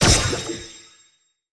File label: UI Menu UI ONESHOT; Monster Jam Steel Titans